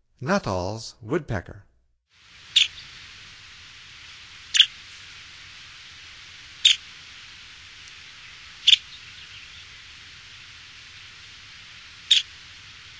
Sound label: Nuttalls Woodpecker; North America; Stokes